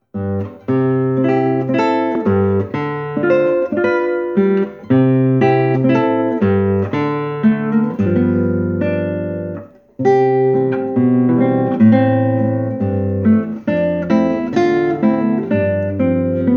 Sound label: Guitarist; Tucson